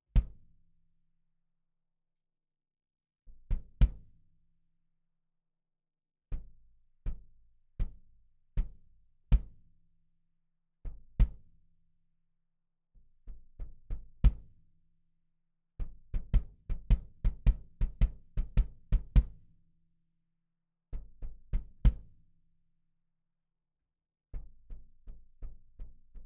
DPA 4055 Bass Drum Aufnahme Reso Loch innen 5cm

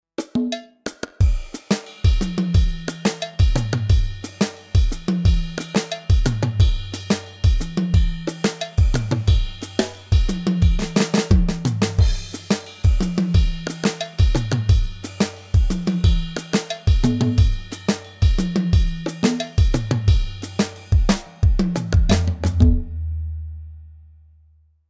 Fusion Set mit Percussion

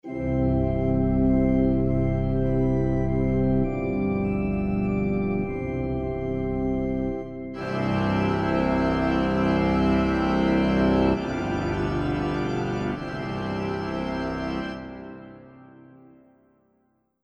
Kirchenorgel

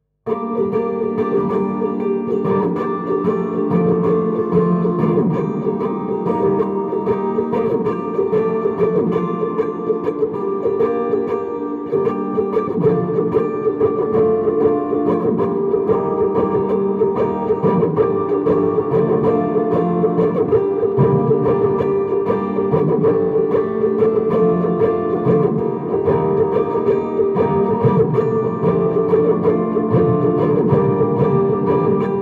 Schrummschrumm mit FX

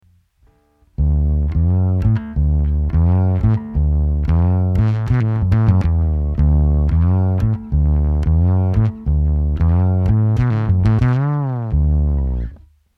Bass Mono EQ Nach Meinem Geschmack; GreatRiver; Harrison32

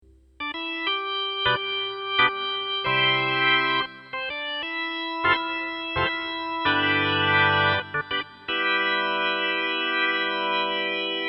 Orgel Strings

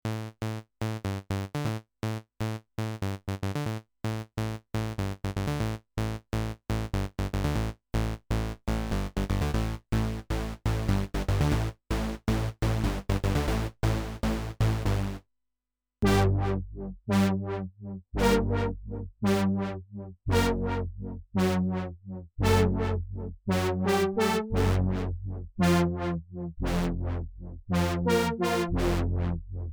Reface CS Saegezahn

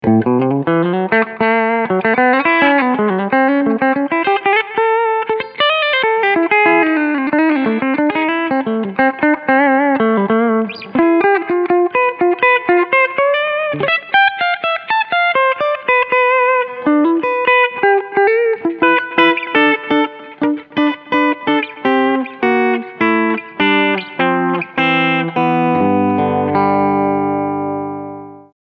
Steg HB Clean

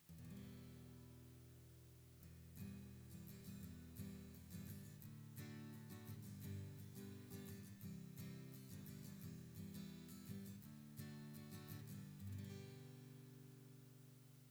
Phase Invert A Git Strum Beyer201; GAP Neve

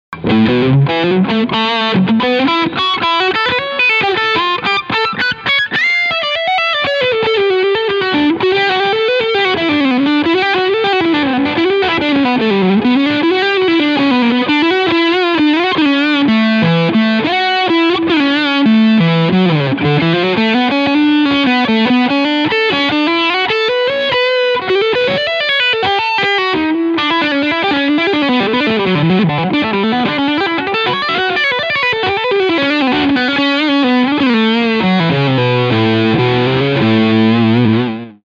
Ibanez SA360NQM Leadsound Steg Humbucker; SPB

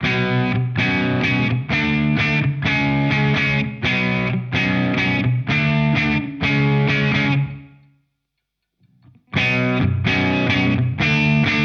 Walrus Audio Ages Alle Stufen Mid Gain

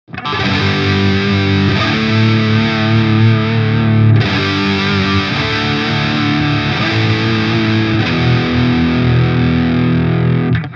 heftige Zerre; Friedman BE-OD

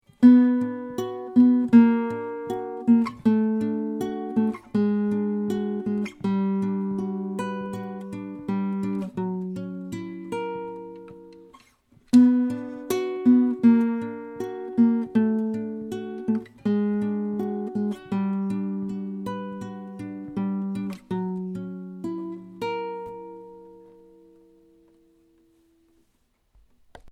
Akustikgitarre Nylonsaiten

